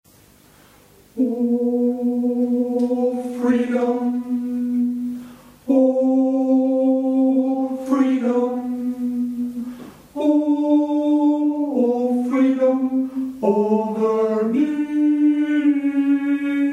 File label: Freedom Ténor